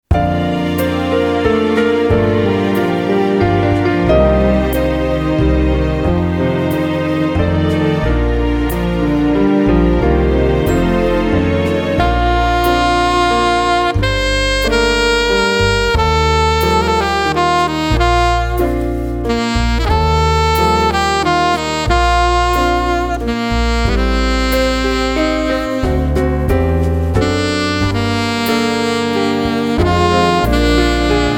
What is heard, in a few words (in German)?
Saxophonist Langsamer Walzer; Hochzeit Tanz Standard Latein